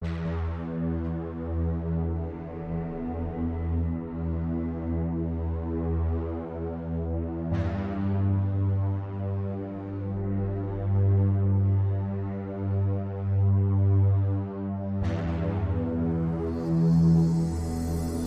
Drone, No Rhythm

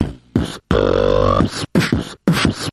Comic Mouth Percussion Variation

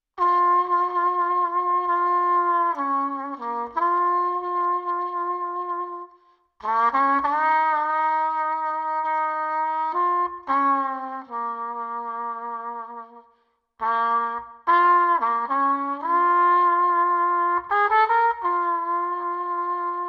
Jazz Nostalgia

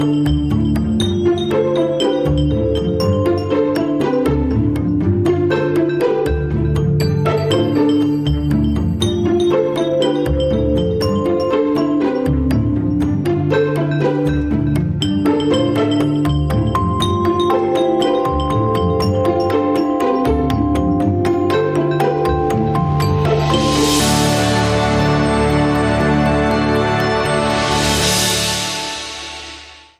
Underscore No Perc

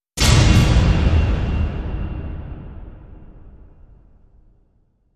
Metallic Hit

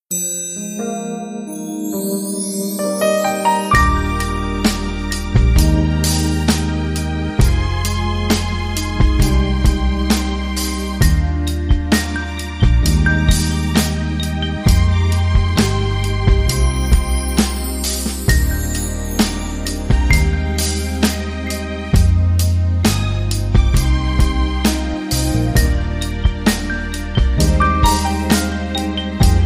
Underscore

